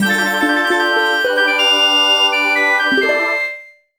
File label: Jingle Item Get; Disney Fairies Tinker Bell and the Lost Treasure; Nintendo DS